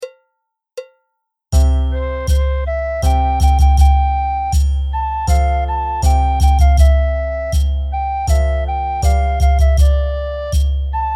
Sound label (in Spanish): el cocherito lere con percusion